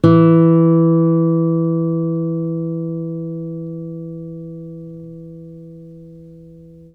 ACC FG E, Acoustic Guitar; East West; Steve Stevens Guitar Samples Collection

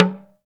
TALK DRM, Heart of Africa; Spectrasonics

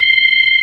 MID FAST, ToneWheel Organ; E-MU Formula 4000 Series Vol. 3; Analog Odyssey

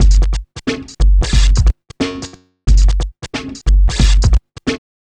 bass station 090bpm; Zero-G; Downbeat Dance Grooves

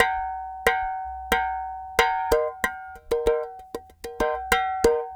clay pot, world percussion

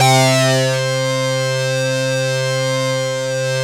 SYN ACID; AMG - Now CD-ROM (Roland); Megabass 1

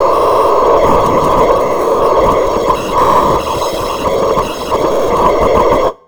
loop, techno atmos

